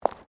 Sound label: FOOTSTEPS WOMENS LOW HEELS CONCRETE STEP